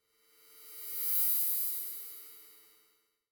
Electric Buzz By Passing Thin Electric Buzz; Hollywood Edge; sound designer's toolkit v2

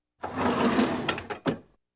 Household Phones

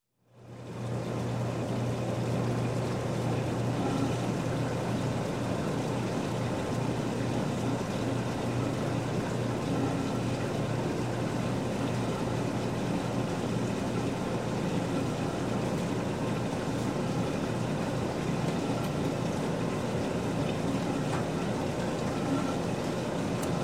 Laundromat, Ambience General Ambience; Sound Ideas 2000 Series

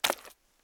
mud; firefight

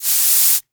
Hydraulics; 1001 Sound Effects

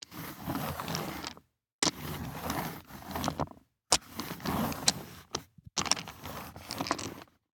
DICE on Neoprene, Slide, Standard, 5 Five Dice; Samples; ArticulatedSounds; DICE Sfx Party (Extended)

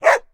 Dog Bark; Blind Drive

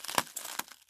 glass; Pitch Black - A Detective's Demise

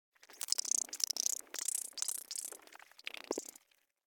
Insect Movement Vocal Twitter; Hollywood Edge; sound designer's toolkit v2

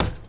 Street Ball Glass Slap; SFX Kit

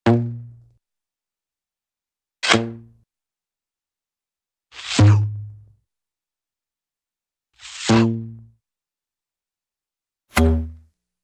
Hollow 'bomp', Cartoon; Hollywood Edge; Cartoon Trax

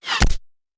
Bullet Impact; Sound Ideas; Guns

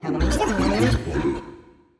Speaker Hit

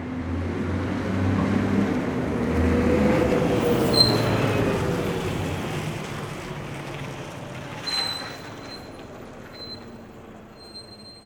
bus driveby; best service Blue Box

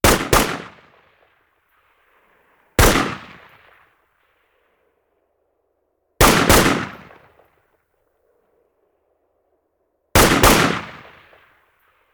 Rifle; Sound Ideas; Guns

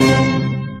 hit; Quentin C's Gameroom

